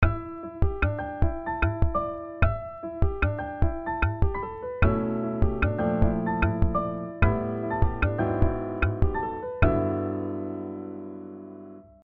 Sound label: mel and rhy poly